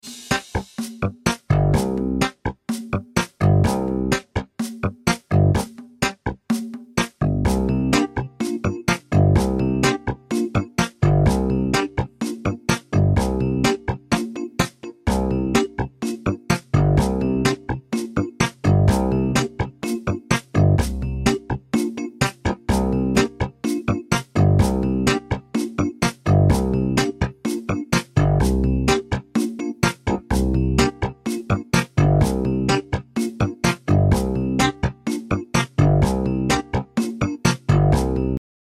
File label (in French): invention